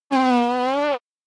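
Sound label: electronic comical fart; anemoi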